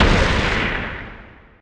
asteroid damage carbonic big; Factorio

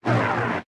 arm extend, asteroid collector; Factorio

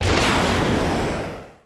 rocket turret launcher; Factorio

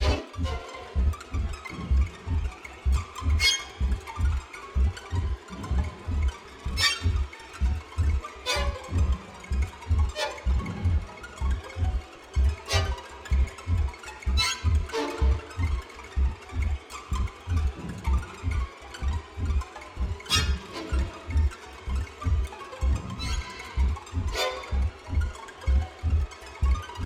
Creeping Horror

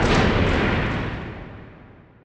asteroid damage metallic huge; Factorio

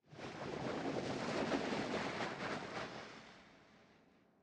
sand wind gust

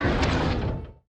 rocket turret deactivate; Factorio

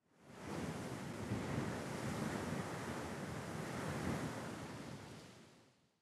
wind gust; Factorio

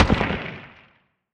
asteroid damage carbonic small; Factorio

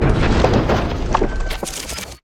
space platform tile build, factorio sound terrain

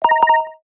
Pulse Beam

